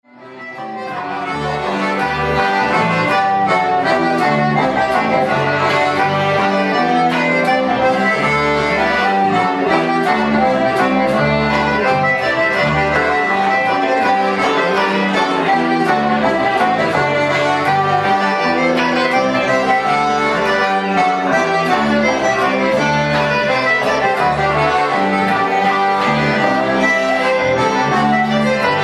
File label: staffordshire hornpipe